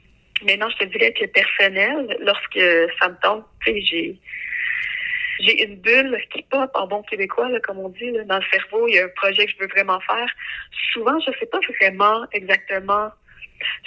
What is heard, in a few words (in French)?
Solo